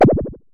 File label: Synare Filter; Star Instruments Synare 3